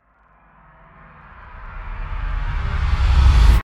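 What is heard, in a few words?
Trailer Hit Reverse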